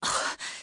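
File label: moan, female; Interactions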